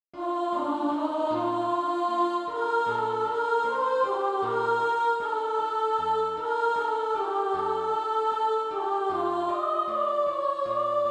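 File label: soprano, Practice Music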